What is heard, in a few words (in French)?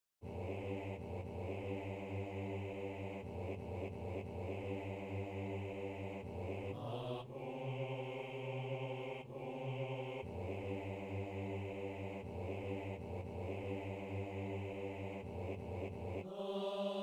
basse